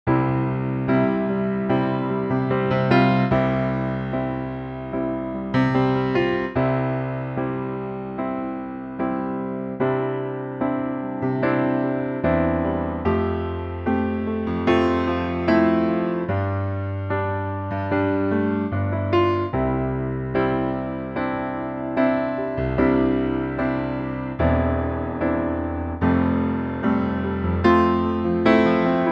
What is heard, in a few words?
C piano; key - C; Pianotrak